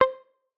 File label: pluck